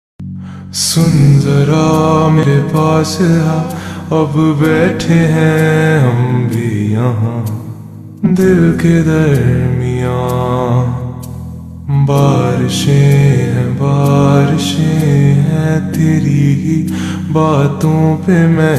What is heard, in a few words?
Slow Reverb Version Ringtone